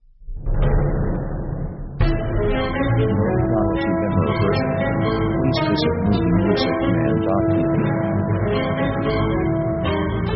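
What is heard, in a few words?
hollywood action orchestra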